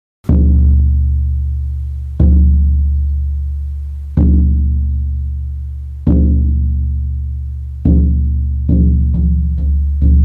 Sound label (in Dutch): geit, sjamanendrums